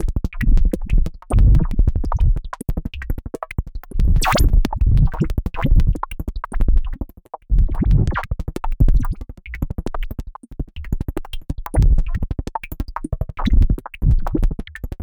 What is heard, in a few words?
kick explorations